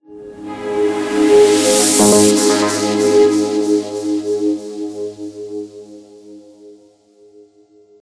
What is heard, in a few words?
Transition End; Everspace 2